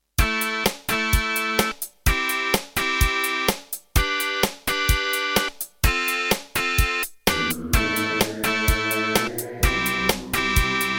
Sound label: Terratec Wave XTable; raptor call of the shadows